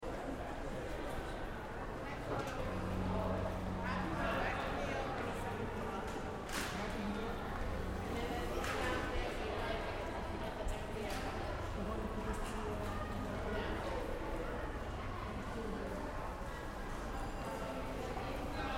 Office Lobby Crowd Ground Floor; Empire State Building NYC; reception area; Light crowd wal

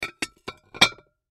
Plates Stack Dish, Plates Stack Dishes; Dishware; Blastwave

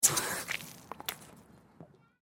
Water Garden Hose Faucet Squirt Lite Suction; Yard Tools

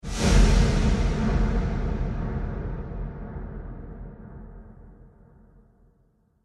Trailer Hit Swoosh; Miscellaneous Impact Sound Effects

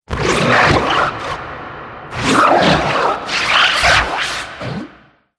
Alien Slurpy Atmospherics; Alien Sound Effects

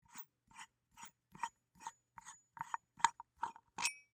Bolt Loosen Screw, Squeak; Machinery; Machines; BlastWave

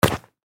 Impact Human Body Punch; Human Body Hit Wood Baseball Bat; Punches and Hits; Blastwave

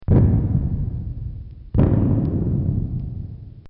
Mortar Explosions X2; Guns; Bobby Cole

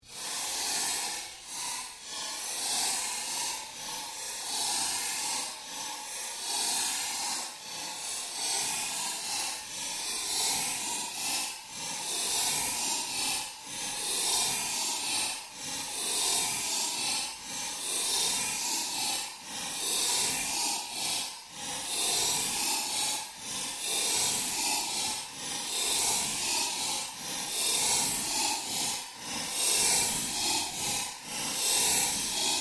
Created Fluctuation Drone, Drone Sound Effects; sound building blocks created through manipulation of raw material

